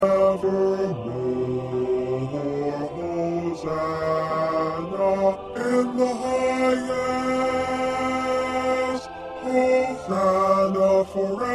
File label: 1st Bass